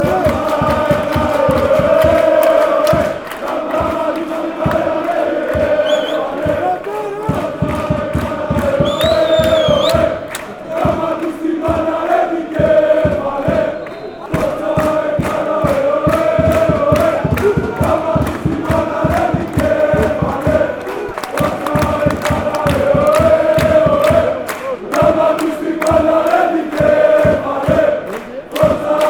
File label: chant; aek athens